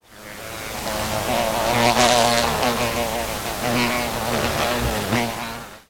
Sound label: Flies Buzzing Loop